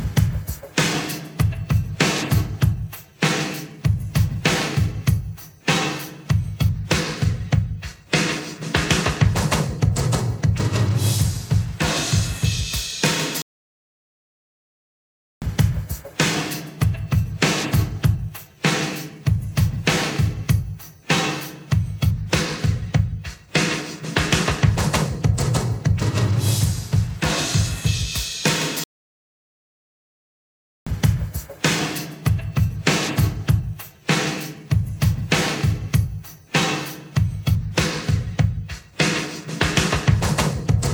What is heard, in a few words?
fill, drums